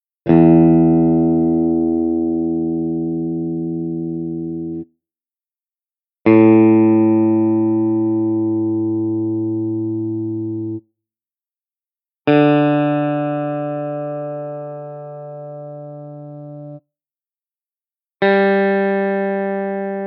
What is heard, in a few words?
guitar